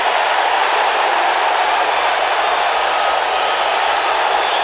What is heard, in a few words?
BMX Crowd Cheer Loop; Crowds